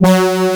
horn G2, synthesizer horn; AKAI S6000 CD-ROM - Volume 1

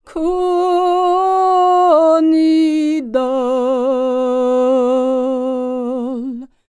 SERBIA, Vocal World Voices SERBIAN

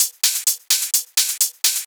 Hihat Loop 128 BPM

